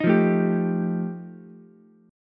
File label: F 9th F Ukulele C6, chord; strummed; JGuitar